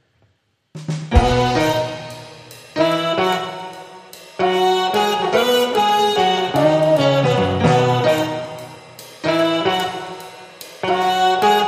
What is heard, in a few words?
Instrumental